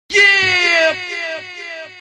Yeaaah

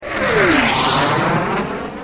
Jet Plane Passing

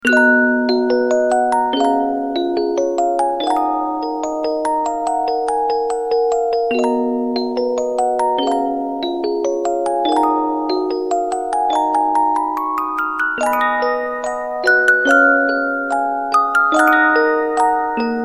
Bells yea

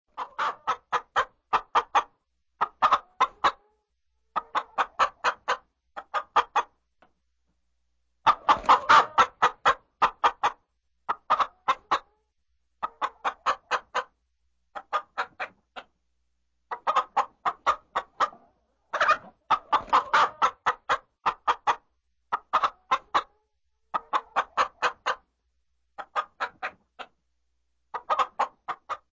Chicken Clucks

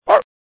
barking

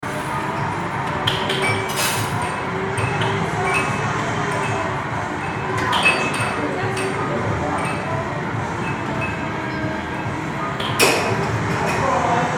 Arcades Game Room For Kids; Tomlija

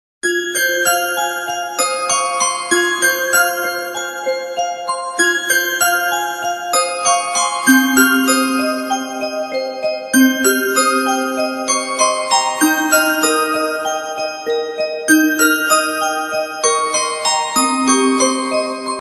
Arabic Bells

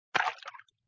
Water Splash Small

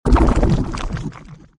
Power Down; Zerg

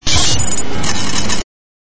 bad signal

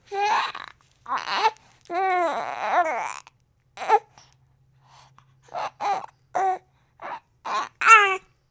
Gurglecry

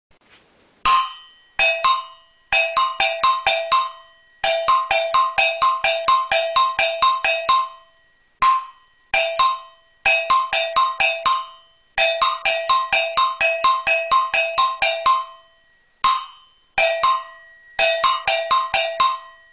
Agogo Bells

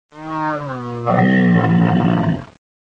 The camel moaning

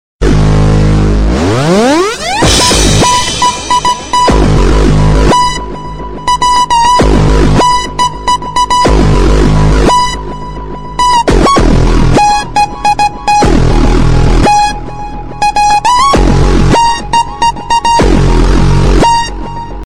Music Playing

